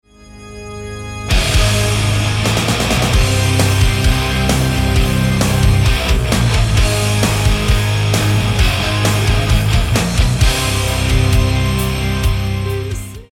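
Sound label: Chromatic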